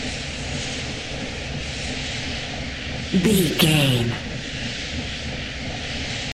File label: background steam boiler room machine loop